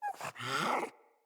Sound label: Puppy Interact Wake; Enemies; Vocals; Kubrow